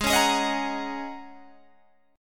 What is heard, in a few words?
G sharp 9th Suspended 4th, Guitar, Open G; G#9sus4; 18,18,18,20,19,20; strummed